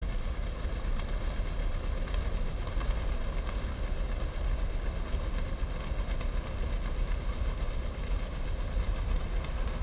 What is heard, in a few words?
Container ship at regular speed